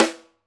Piccolo, drum

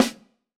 Snr, drum

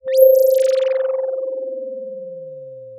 Sound of a phone ringing

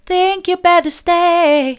think you better stay soft, vocal

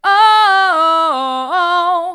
ooh hard, vocal

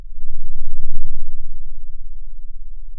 Sound of a lion’s roar

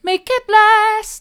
mid, vocal

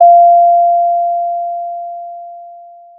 Sound of a doorbell

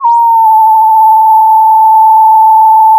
Sound of a police car siren

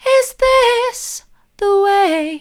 soft, vocal

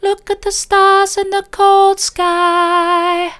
soft, vocal

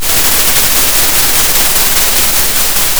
pop, Sound of a thunderstorm

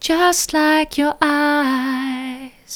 soft, vocal

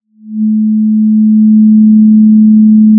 Sound of a cruise ship horn

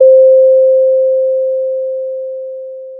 pop, Sound of a doorbell

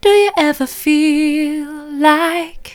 do you ever feel like soft, vocal

